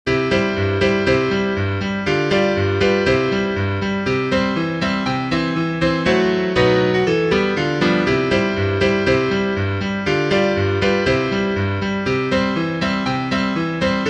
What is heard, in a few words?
piano